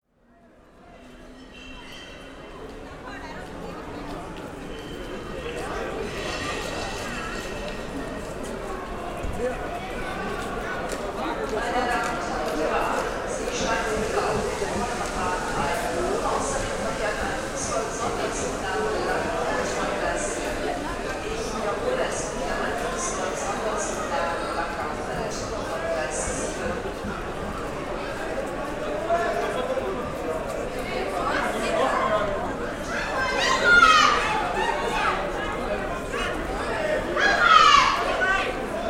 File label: fans hbf